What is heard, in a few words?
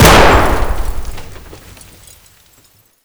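grenade explosion indoor close; Escape from Tarkov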